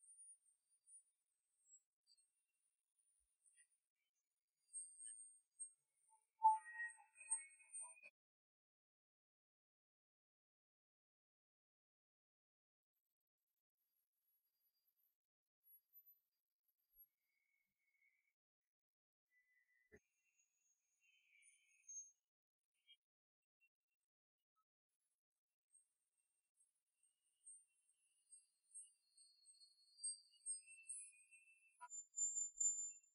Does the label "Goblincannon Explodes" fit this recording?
No